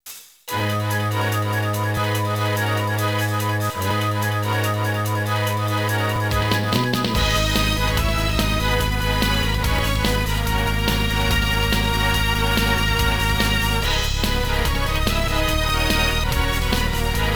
Battle Theme 4 Ship; Dungeons & Dragons Collection; Saturn

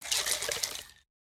Shake; Cambassador

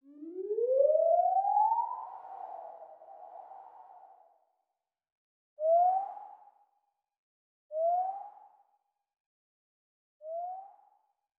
Fly out; Monmen Fly out001

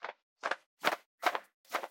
Fly in; Tsutsukera

